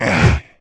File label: mummy attack; spawners mobs